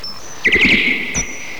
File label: pipi pipi social, bats; britishbats